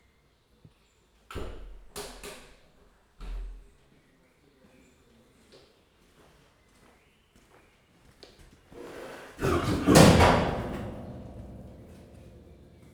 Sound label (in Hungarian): rejtett mikrofon, takarítás, rácsnak ugró állat, leopárd; jaszberenyi zoo 2015